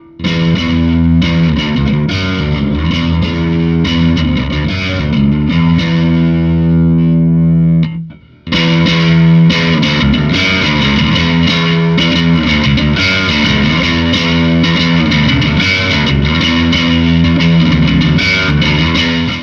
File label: humbug, bass